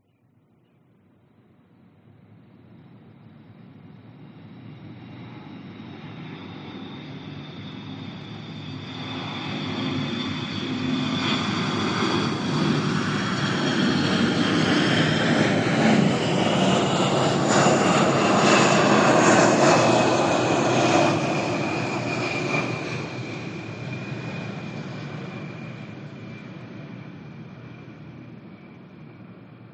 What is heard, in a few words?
vehicles airplanes military f15 or f16 fly overhead